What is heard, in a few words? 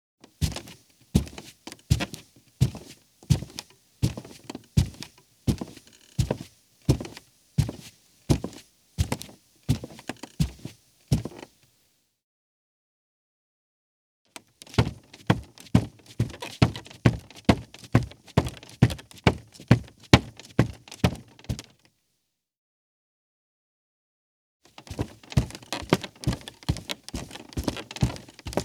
Footsteps, Foley; Sound Ideas 6016